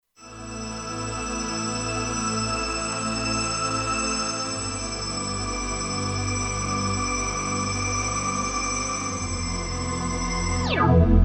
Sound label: Ethereal Strings, Kurzweil K2500X; Factory Programs